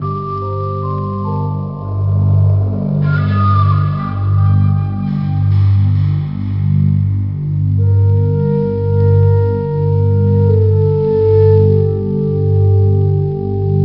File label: MUSIC LEVEL